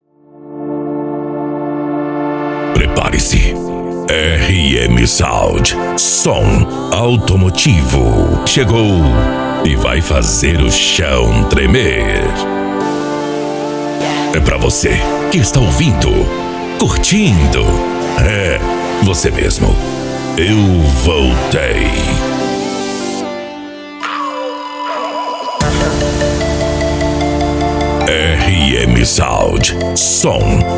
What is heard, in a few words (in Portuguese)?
som automotivo